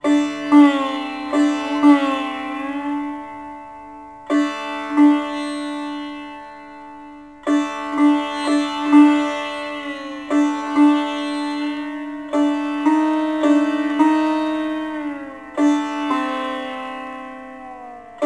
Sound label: SITAR